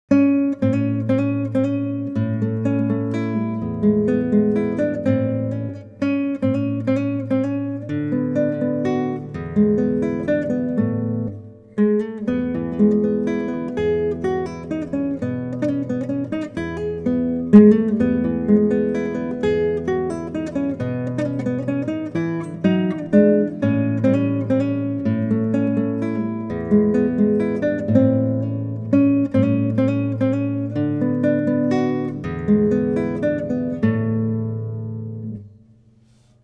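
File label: moderato, guitar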